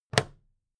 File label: plastic clasp close heavy